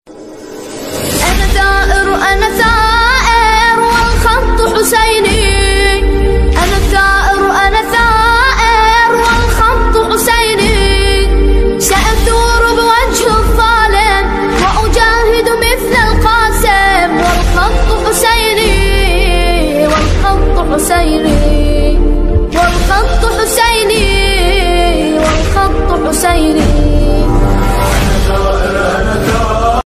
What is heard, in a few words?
arabic ringtone islamic ringtone muslim ringtone